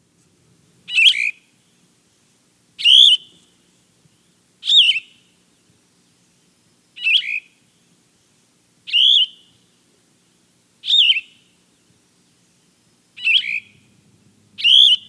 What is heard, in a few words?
Yellow-throated Vireo